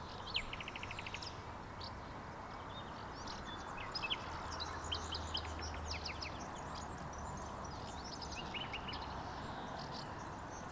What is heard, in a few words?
Sfx Environment Hub Ambience; Akxolotl